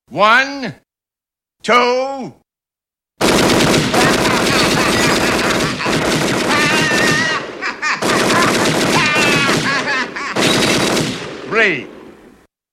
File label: Shootings